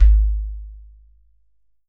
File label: GHATAM